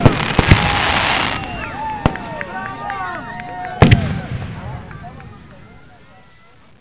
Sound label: win score